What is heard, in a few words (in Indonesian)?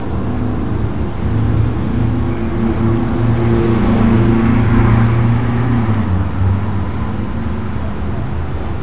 suara mobil